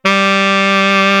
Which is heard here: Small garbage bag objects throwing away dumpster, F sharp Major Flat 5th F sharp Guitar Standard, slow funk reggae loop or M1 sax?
M1 sax